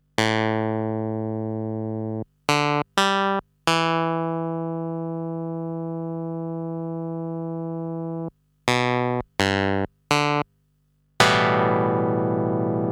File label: SE50 Clav Rhodes